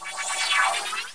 magic

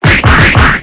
punch

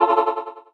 portal enter

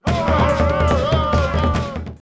battlecry

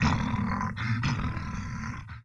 bear

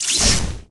lightning bolt

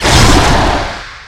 salt

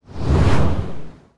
sandblast

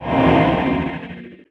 mirror

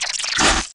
spider

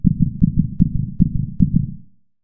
heartbeat

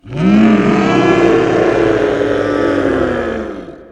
dragon

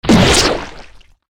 sword

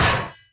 block melee

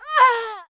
die girl

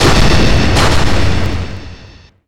ice storm

